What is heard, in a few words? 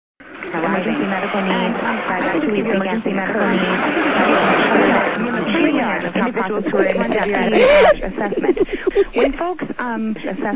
laughs